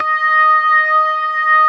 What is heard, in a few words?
ORGAN COMBOS; Keith Emerson Signature Series